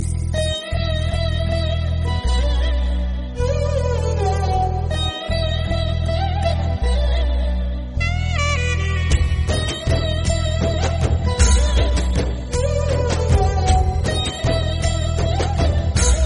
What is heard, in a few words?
Instrumental BGM; Okkadu